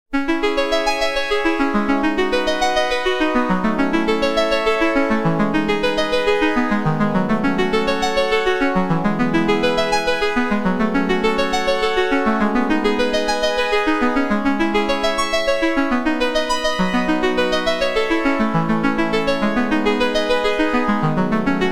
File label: opl3 arp